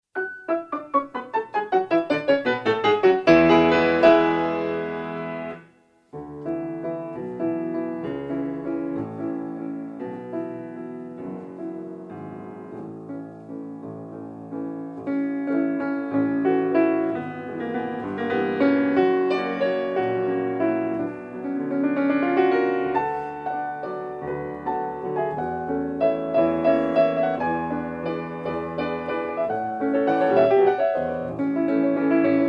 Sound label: Waltz